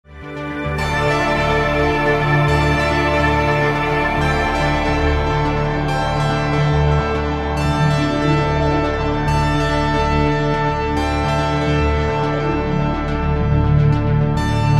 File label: Ambient; Europa